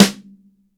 MIX SN, DRY STANDARD SNARE

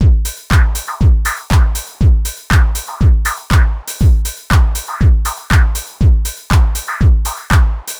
120 trance beat, Drum & Perc Loops

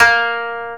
KOTO A#2; USB Soundscan vol.60 - Asian Traditions; AKAI

